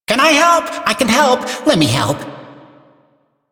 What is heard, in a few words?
Barks Bird; Warframe; Entrati Cavia Vendors; Bird3